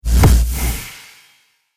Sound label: Cinematic Strike Twin Magic, trailer; Trailer Sound Effects